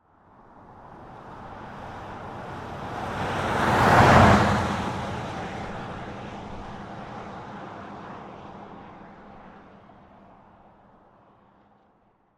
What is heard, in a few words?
Dodge Ram, Transportation, Automobile, Dodge, Ram, Pick, Up, Truck, Pass, By, 40, Mph